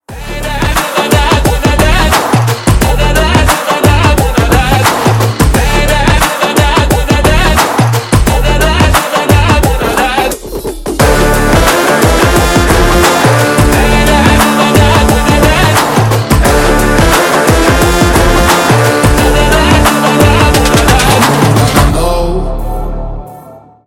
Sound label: Trailer BGM Theme; NBK111 Glimpse